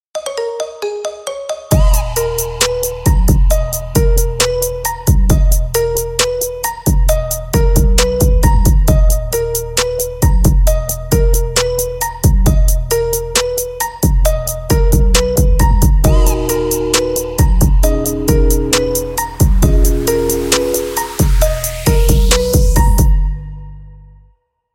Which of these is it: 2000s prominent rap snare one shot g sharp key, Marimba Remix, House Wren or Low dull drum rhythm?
Marimba Remix